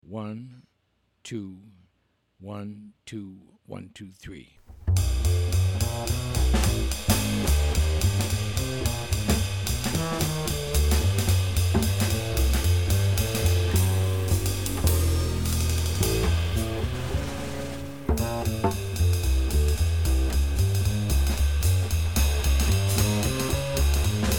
minus Piano

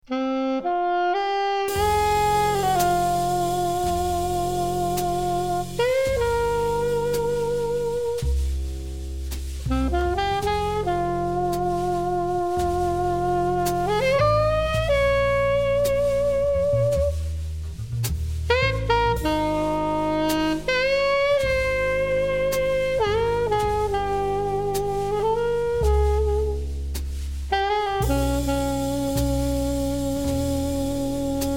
minus piano

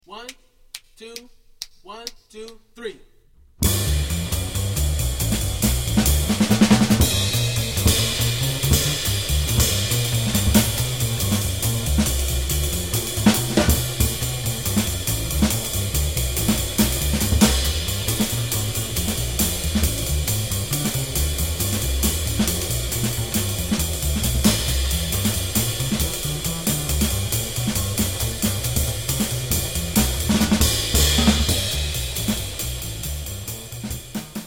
bass drums only

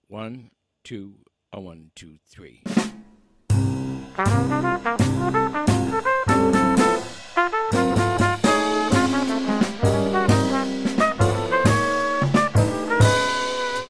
SLOW minus bass

